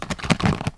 vstryahivanie korobki

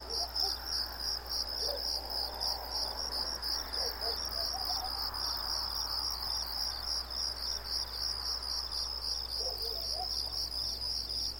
vechernee spokojnoe sverchanie sverchkov na ozere s otdalyonnimi redkimi zvukami ptic

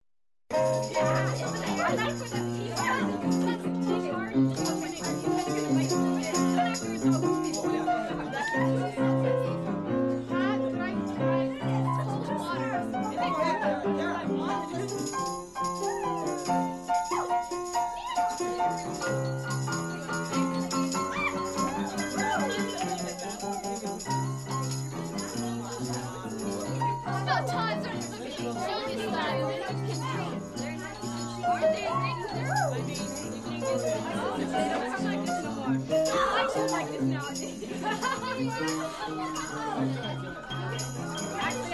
vecherinka igraet fortepiano golosa lyudej

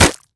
silnij udar nozhom po kokosu

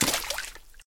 nesilnij vsplesk ot raskachivayushejsya na volnah lodki

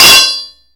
oglushitelnij zvonkij metallicheskij udar

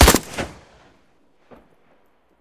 korotkij odinochnij pistoletnij vistrel

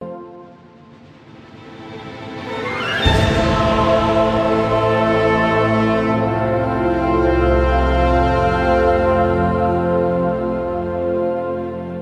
zvuk orkestra dlya sceni v kino

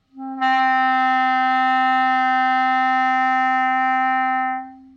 klarnet odinochnij dlinnij zvonkij

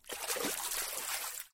barashki voln na beregu

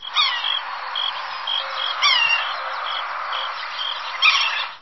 krik dyatla v lesnoj chashe

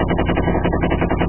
ceplnaya pila rezkii blizkii priglushennii

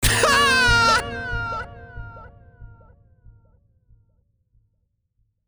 haa zvuk pridumivaniya i smeha

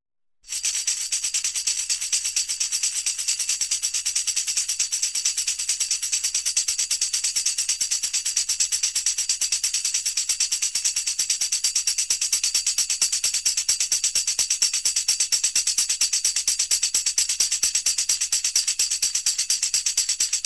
tamburin vstryahivayut

